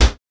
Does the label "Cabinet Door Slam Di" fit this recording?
Yes